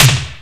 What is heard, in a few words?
big e snare, Emu Sp12